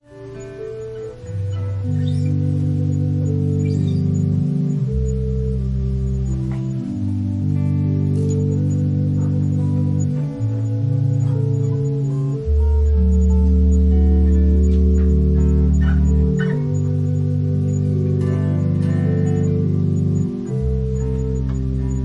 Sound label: Organ prelude